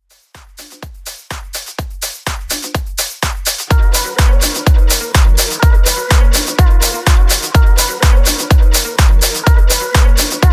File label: Club Redrum 125Bpm Dirty